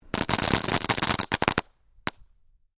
Fireworks Spark Burst, Variety of fireworks being detonated